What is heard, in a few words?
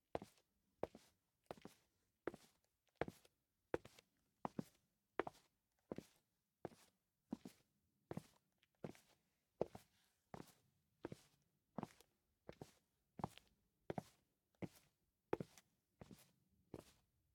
Footsteps; LOOPERS; Tyler